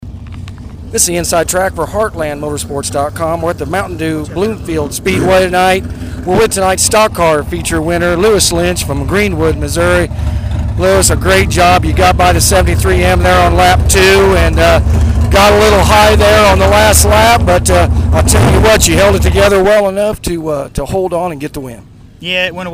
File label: Stock Car; 5-5 Bloomfield